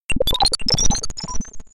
Space Bleeps

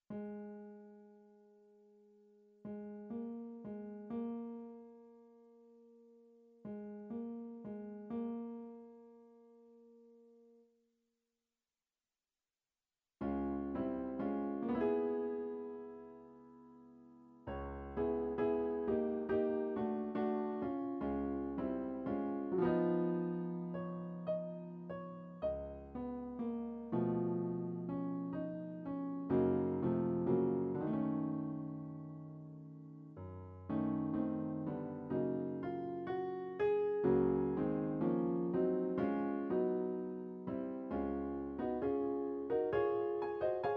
Piano Concerto 2nd Movement; Gershwin

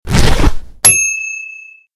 Zipper Loud

